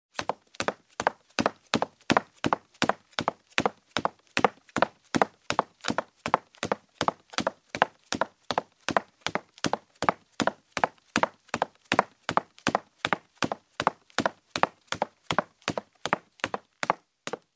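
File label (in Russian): бег по деревянному полу на каблуках; beg po derevyannomu polu na kablukah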